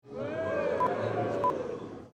Wooooh

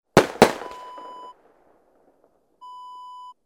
gun two shots

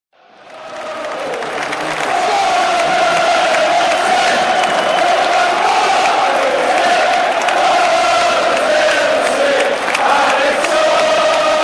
Sunderland and its sunderland fc, fan chants

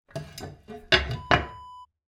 wood burning kitchen oven door close

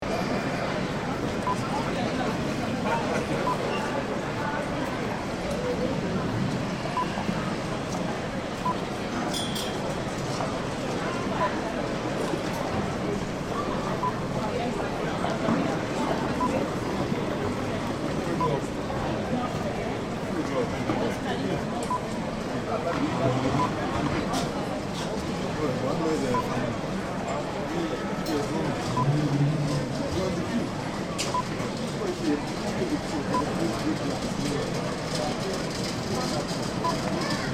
Heathrow Airport Terminal 3 Checkin

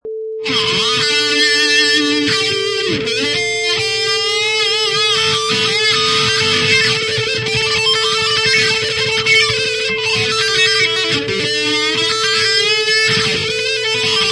Distorted Guitar Solo 87 bpm in Cm Loop

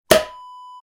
champagne cork pop

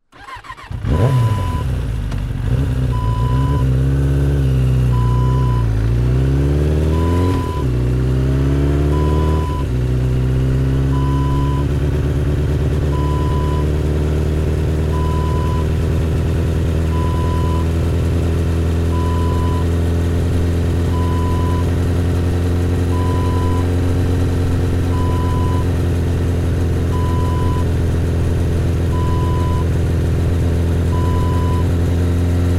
motorcycle ride

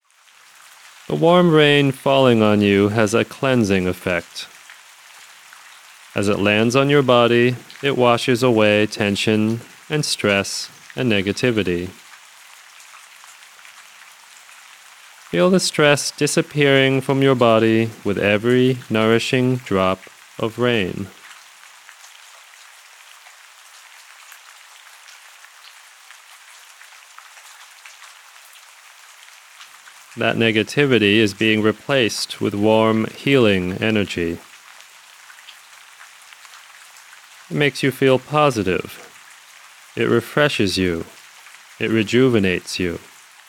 Rejuvenating Rain

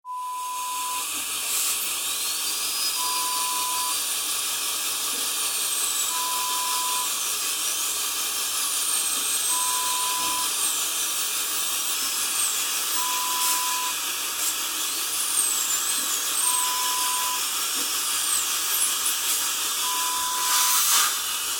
Dental Surgery sound

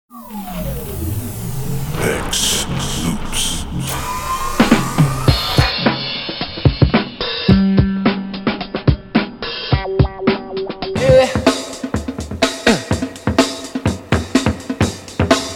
sample oldschool drums

